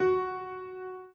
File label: Piano Chord